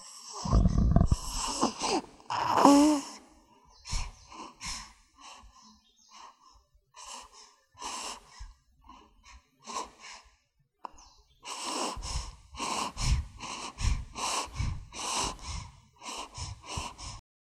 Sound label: Baby Girl Breathing